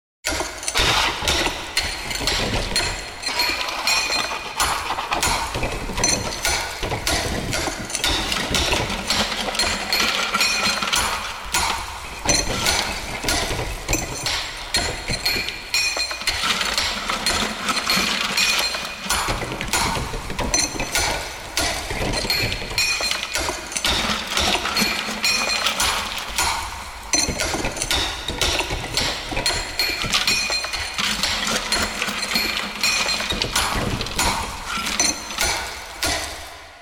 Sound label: mining hammering rock shoveling